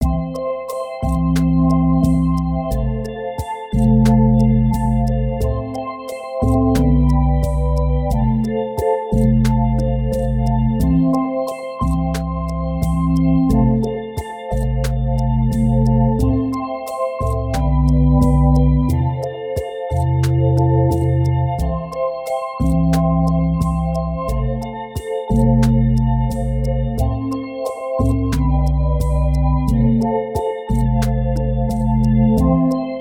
New Electronic Beats

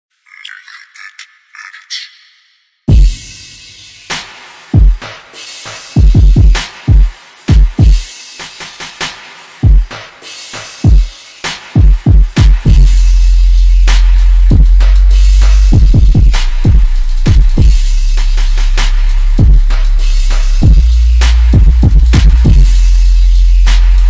bassboost reverb daycore

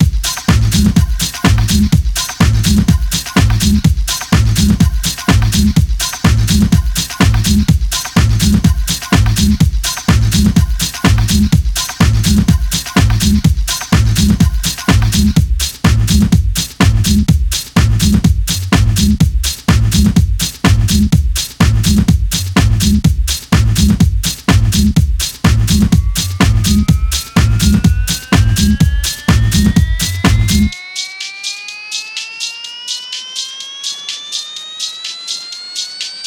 Bass Beats

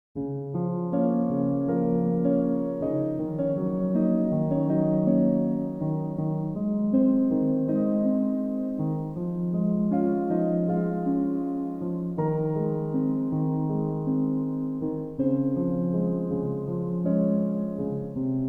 Relaxing Piano Music

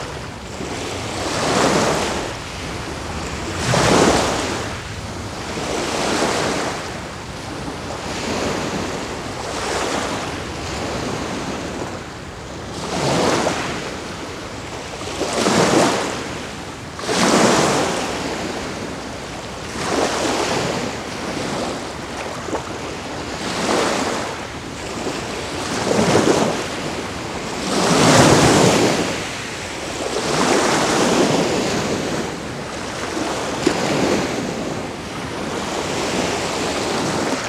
Shakuhachi Tweeting Birds

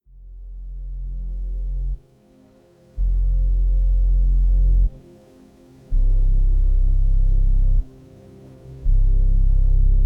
Alpha Waves Concentration

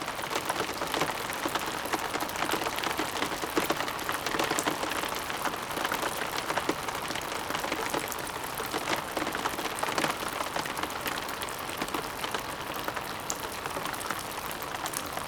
Rainforest Sounds Rain Sounds Softly Trickling; SleepTherapy

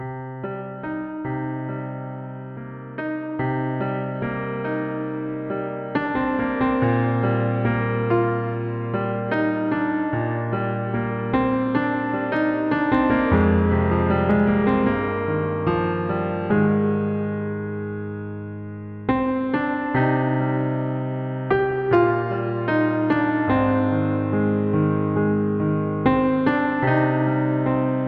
Relaxing Piano

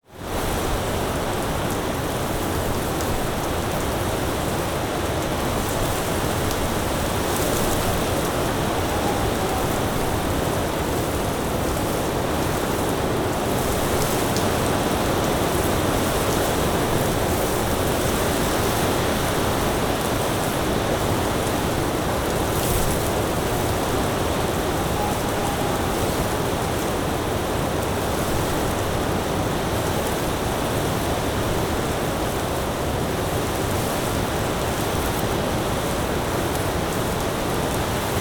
Sounds Of Rain, Windy Shower

